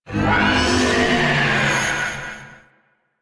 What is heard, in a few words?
Ship Distant Energy Pulse; Warframe; Corpus Ship Remaster